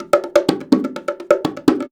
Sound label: Percussion Loops, Bongo & Conga; Houseworx